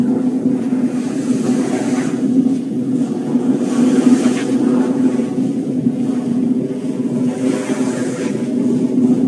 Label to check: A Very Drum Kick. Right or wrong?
Wrong